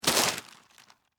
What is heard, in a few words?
Foley, Plastic, Bag, Rocks, Pick, Up; Rocks (Sound Effects:Materials)